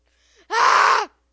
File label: Screem